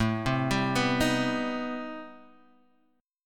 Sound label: C Minor Major 7th, A, chord